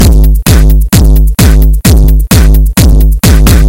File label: boom chuk 130